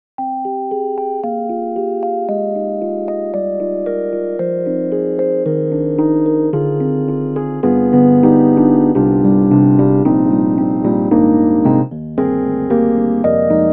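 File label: Suitcase 88, Rhodes; EDIROL; Super Quartet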